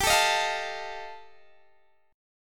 G 7th Suspended 2nd Sharp 5th G chord, Guitar DADGAD